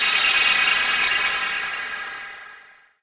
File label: sunshine, anime